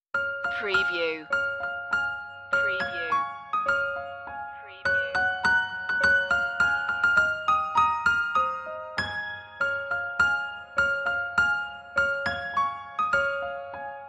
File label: piano; jingle bells; media music now